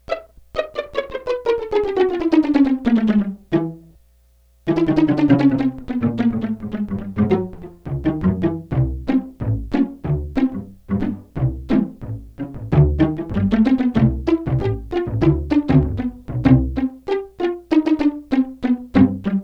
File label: low strings high strings pizzicato